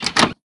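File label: dungeon door; Etrian Odyssey I HD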